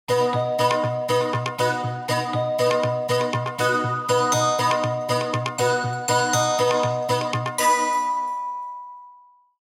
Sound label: Congas